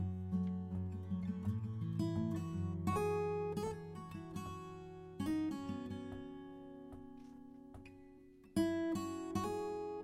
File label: melody line